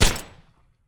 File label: Gun Shot; Game rip; MiSide